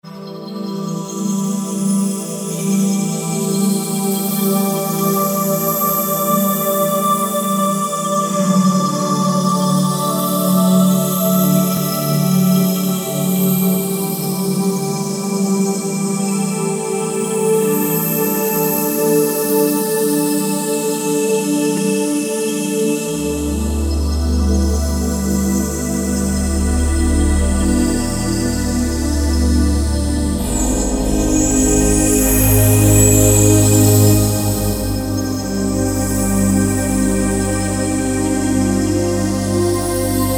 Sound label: Roland JD-990; Factory Patches examples